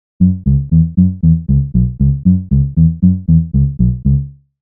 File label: Bassline